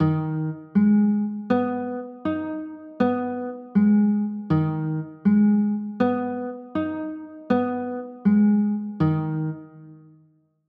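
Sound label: G sharp m6 chord, guitar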